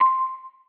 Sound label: Cartoon Bell One Shot - C; Lofi